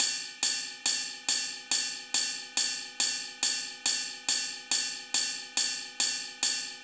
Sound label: drum Loop 140BPM Ride; Vengeance - Essential Clubsounds Vol.5; VEC5 Drumloops; VEC5 Multi Loops 140BPM